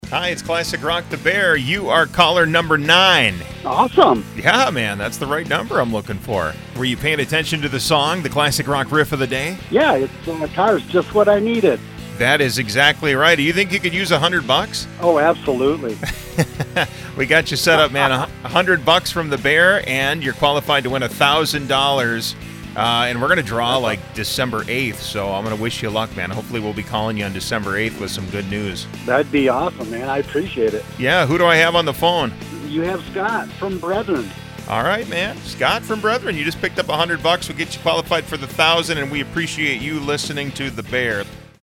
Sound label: Riff